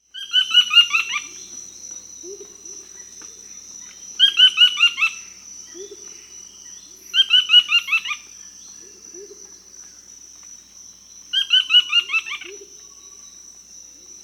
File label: Buff-throated Foliage-gleaner (Automolus ochrolaemus), Peru; S. America